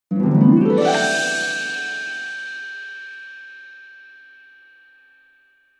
harp glissando and cymbal

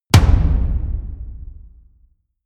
drum hit simple medium

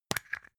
plastic spray can small open clatter

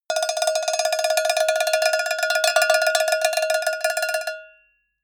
cowbell tremolo

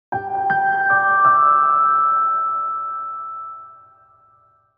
dream piano

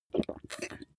glass bottle drink swallow

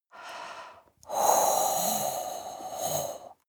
breath female blow heavy

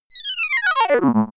signal drop

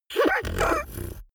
beatbox tongue roll hard damped

